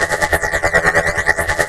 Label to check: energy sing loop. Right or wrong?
Right